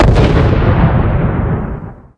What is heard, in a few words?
Elemental sacred fire effect